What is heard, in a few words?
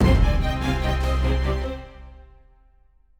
battle end, Orchestral Background Music; Epic Adventure